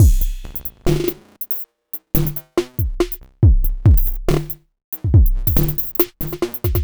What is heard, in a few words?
Early Break, Bpm 140, Dubstep, Drum Loops